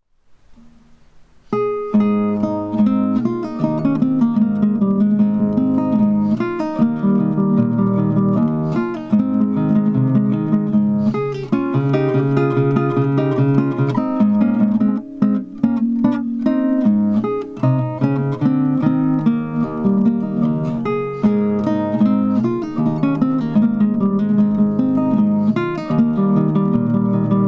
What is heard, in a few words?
menuett